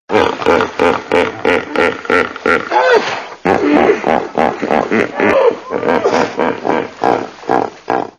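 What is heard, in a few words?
rolling roar close-up, animals; jivotnyie; raskatistyiy rv krupnyim planom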